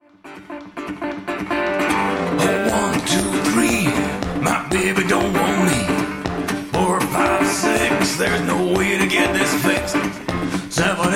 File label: Blues